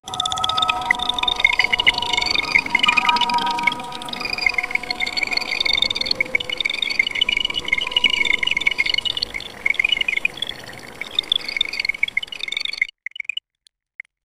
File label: the sound of a japanese, bamboo